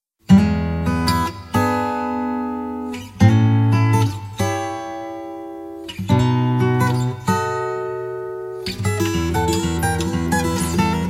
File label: open blues